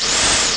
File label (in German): bohrer; Amiga Soundtracker Sample Packs